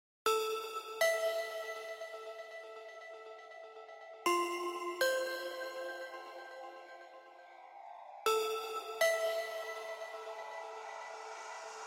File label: music dance; statuette